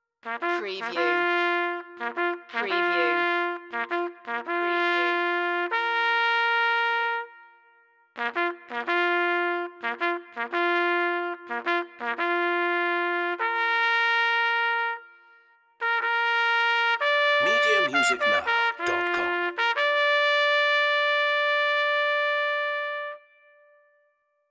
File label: solo trumpet